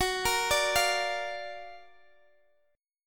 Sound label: Gb Augmented Major 7th, down, Guitar, Half Step Down; Gb+M7 arpeggiated